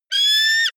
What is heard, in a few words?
eagle call; natur tiere; voegel